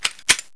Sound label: right clip in